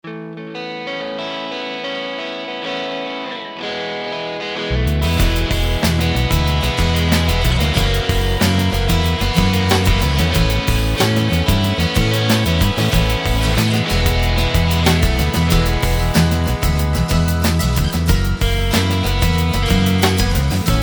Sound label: Alt Rock